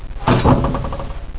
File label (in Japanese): gatagata